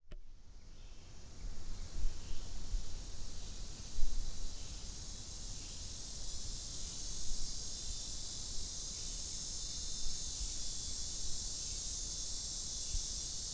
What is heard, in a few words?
Lyric Cicada; It sings throughout the day, but can be especially active with the approach of dusk.; cicadas